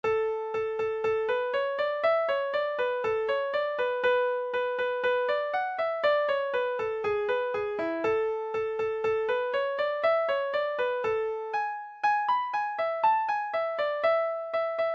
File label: shutter hornpipe, folk music midi